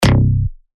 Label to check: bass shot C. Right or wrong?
Right